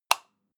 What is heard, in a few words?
light switch off hard